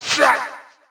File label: Samurai pain